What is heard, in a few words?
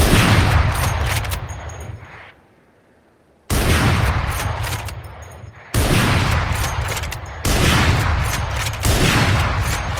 operator; valorant